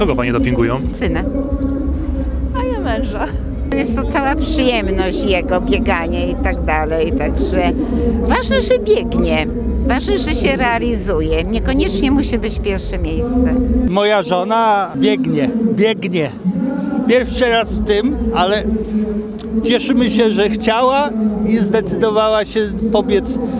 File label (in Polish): kibice